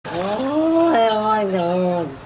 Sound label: oh my dog talking cat